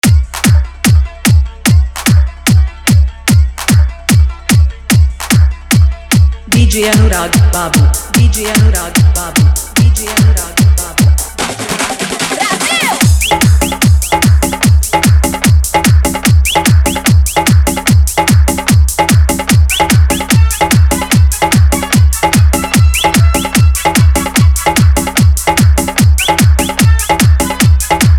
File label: Full Dhollki Bass, Dance Mix